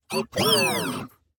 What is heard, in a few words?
aggressive beeps; 堡垒（守望先锋2）; 正在进攻